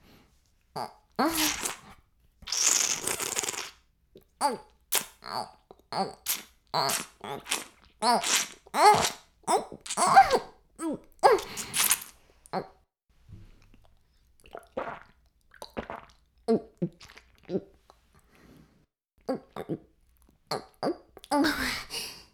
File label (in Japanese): フェラ音声, ASMR; 白くて黒い魔性円環